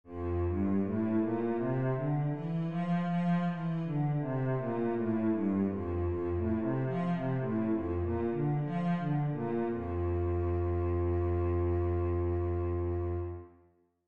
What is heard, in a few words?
f major, scales, cello